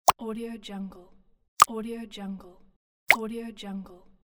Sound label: ui button clicks